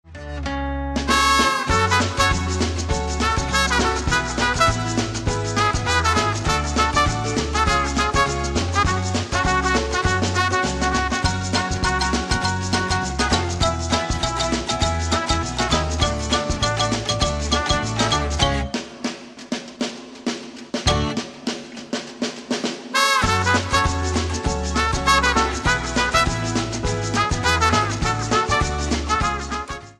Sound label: ringtone